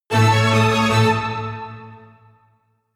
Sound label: jingle orchestra strings fast